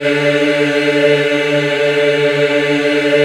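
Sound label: D3 men women eh, Choir; USB Soundscan vol.28; AKAI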